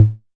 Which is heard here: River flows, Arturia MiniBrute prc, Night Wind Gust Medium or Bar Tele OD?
Arturia MiniBrute prc